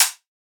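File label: closed hihat; One-Shots; Drums; HiHats; Ghosthack; Color Bass Essentials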